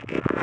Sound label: Grid Fragment E 140 BPM, Psytrance; Xenon; Grid Loops